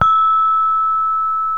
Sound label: hi gangsa mid, gamelan; gravityharps